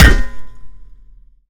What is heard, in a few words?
shovel hit; melee; zombiesurvival; weapons